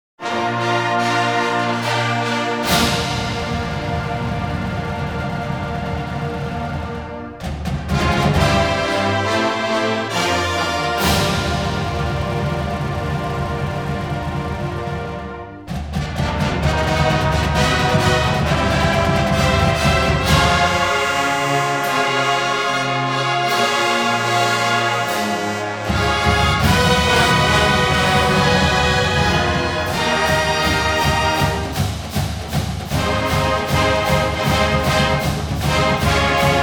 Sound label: Fanfare